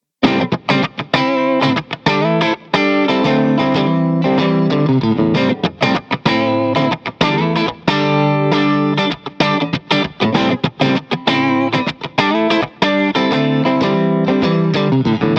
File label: 57 Mid, pickups; Hollywood